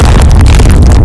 crusher; ambience

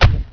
close door

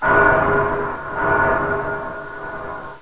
glang, ambience

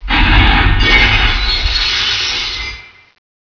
smash

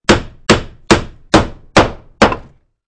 item hammer

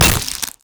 crowbar hit, impacts flesh; weapons

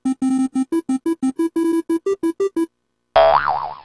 infobox

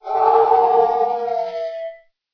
howl

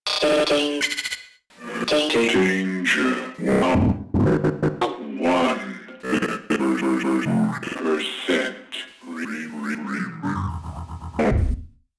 hev glitch

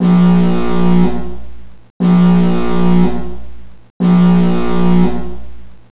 alarm

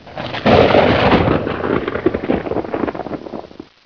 thunder out

